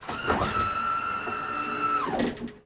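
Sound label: front door open, volvo 9900; Freyfurt Beta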